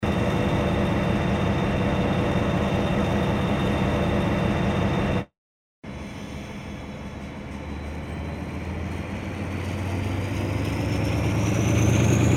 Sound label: train engine